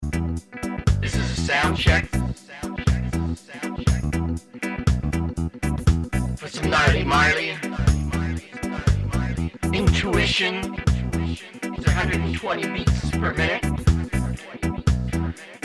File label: Soundcheck